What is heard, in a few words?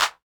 clap